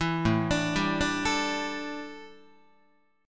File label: A 7th Suspended 4th, A, down, Guitar